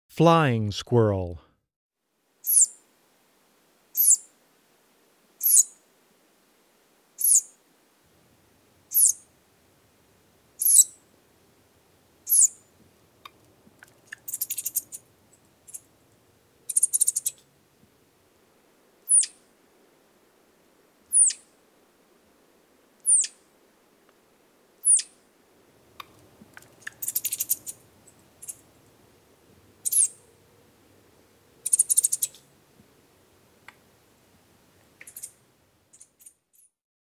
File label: Flying Squirrel, Wildlife Sounds